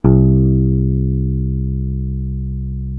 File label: real bass